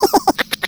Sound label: skelly small; Garry's Mod; tf2; halloween; skeletons